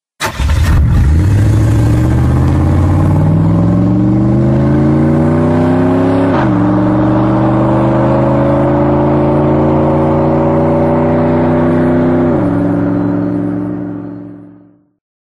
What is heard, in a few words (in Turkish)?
klasik araba sesi